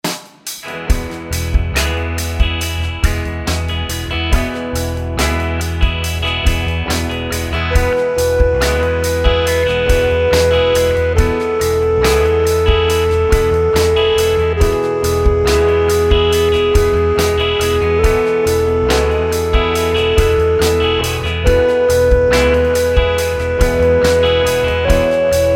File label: scale degree